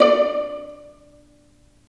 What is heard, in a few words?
Vas Pizz, String; Vlas Pizz; Roland - String Master Series